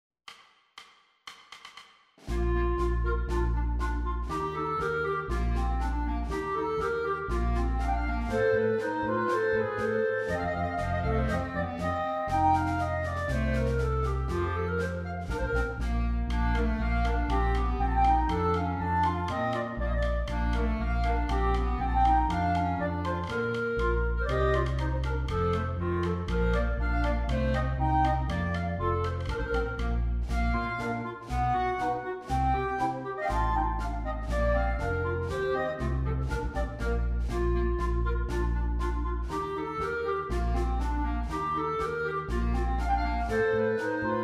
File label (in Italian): duetti progressivi